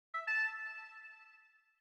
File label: honk, goose